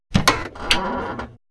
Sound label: bonnet